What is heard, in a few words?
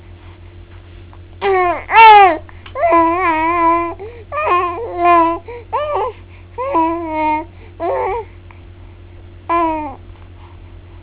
vocalizing